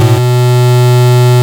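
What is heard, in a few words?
bass distortion B3; LIMAT LSDJ kit; LSDJ Extra Bass Notes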